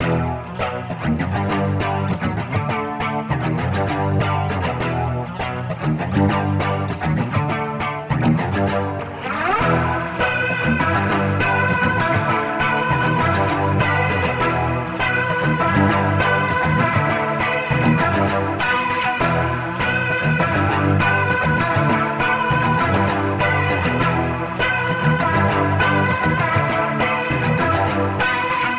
Guitar Loop, alternative; cheeeerrrruppp; 99Sounds x Monosounds; Instrument Loops; Guitars

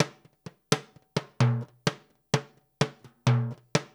LOOP, Percussion, Danzon; InVision Interactive; Percussion Slam

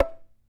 CHARANGO FX

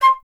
FLT FLTC, Flute; Miroslav Vitous - Solo Instruments VOL-2

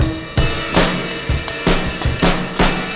Finished 162, breaks; minihalfs

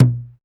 UGANDA DRM, UGANDAN; Spectrasonics; Heart of Africa 1

